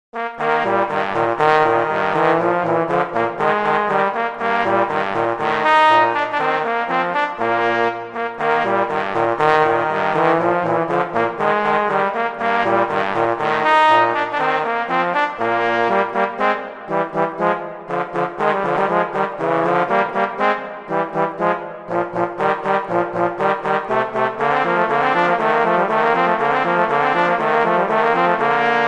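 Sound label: Duet, horn; Mozart; Duets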